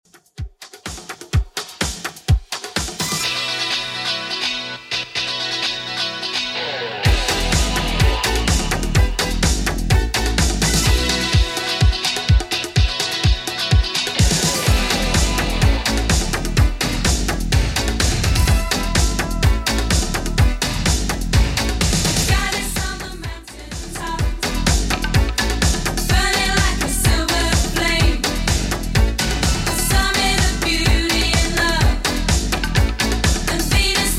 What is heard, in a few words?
80s Redrum 126bpm